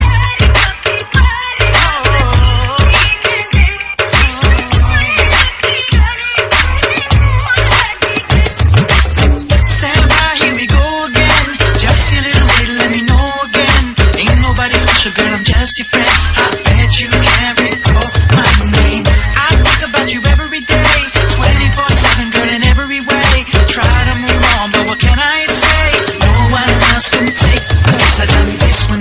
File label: remix tone ringtone, Bollywood